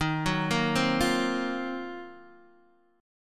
D sharp Minor 6th Add 9th D sharp Guitar DADGAD, chord